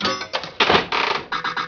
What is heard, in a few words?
casino